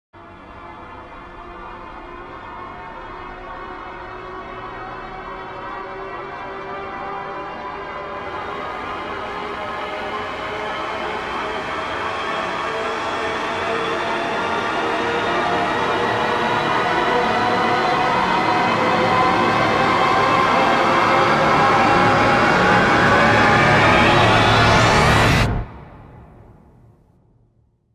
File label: Rise; Ninja Tracks